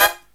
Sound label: HI CHORD, Brass